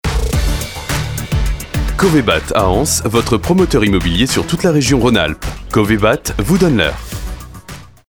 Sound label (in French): top horaire radio; tonic radio